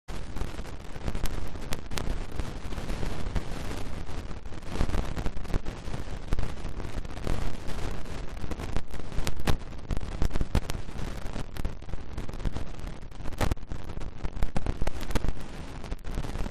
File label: riverside; Kyoto U; Ashiu